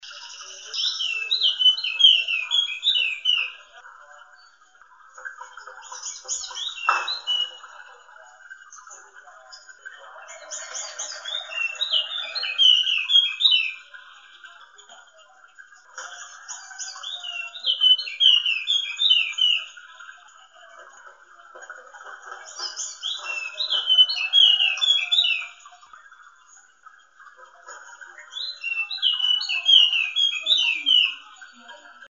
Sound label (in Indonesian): suara kacamata wallacea